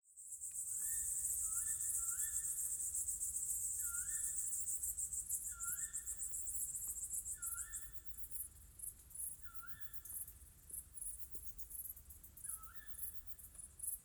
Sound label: Crickets owls summer night, Natural ambiences; Ivo Vicic; Natural ambiences vol.1